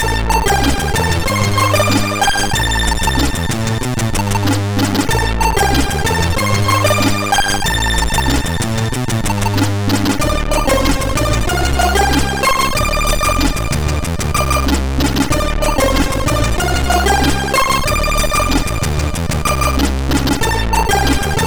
Monitor Madness, MOS6581R4

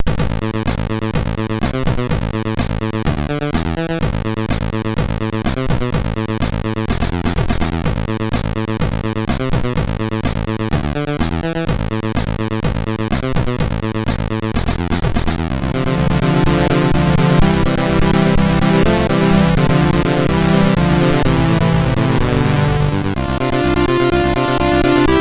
Laser Remix, sid MOS6581R3

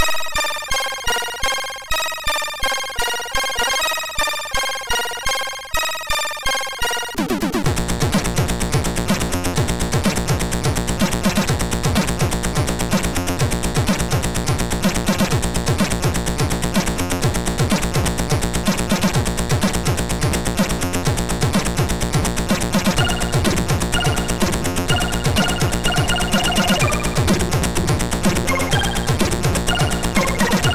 Intro Music II, MOS6581R2

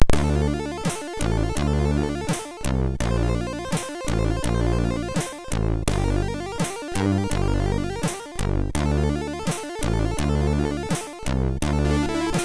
MOS6581R3, sid

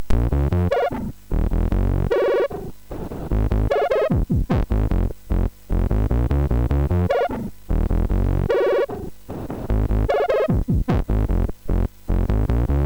Radio Waves, MOS6581R4; sid

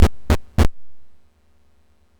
Dragonworld sid MOS6581R4

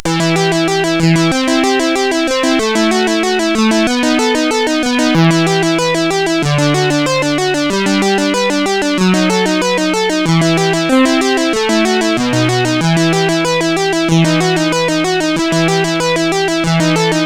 Instrumental Compositions, CSG8580R5

